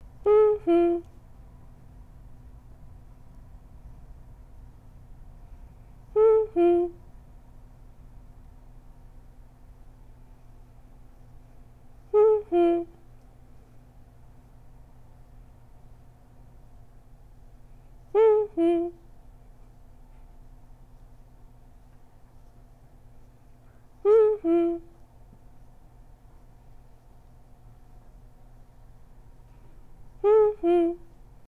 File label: Percussion; ArtsBreak HHC